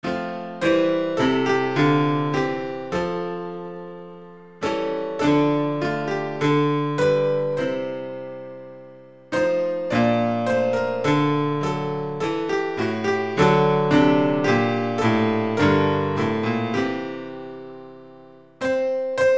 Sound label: instrumental hymns; rejoice ye pure in heart